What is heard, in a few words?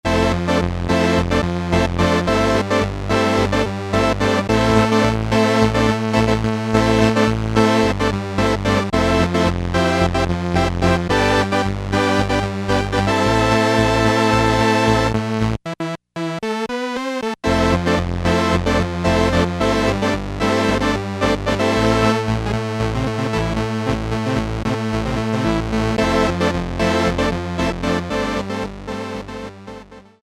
Synth 3 - Big Detune + lfo modulation, Crumar Trilogy